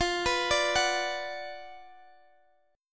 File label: F sharp Major 7th Suspended 4th Sharp 5th